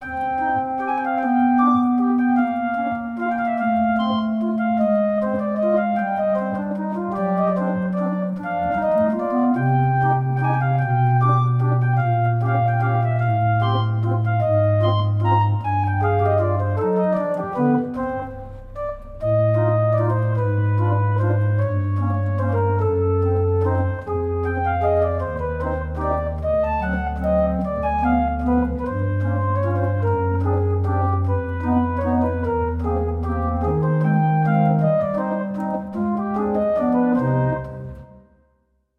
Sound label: Punchcard Waltz, Barrel Organ; Efimov Audio